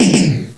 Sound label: BLASTER, SPACE